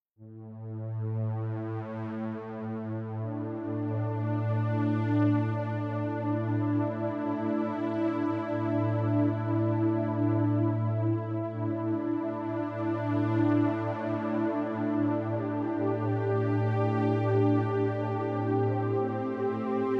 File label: Healing Ambient Music to Heal the Mind and the Spirit